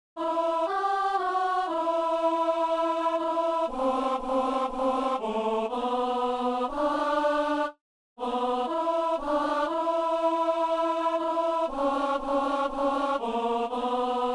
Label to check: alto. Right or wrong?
Right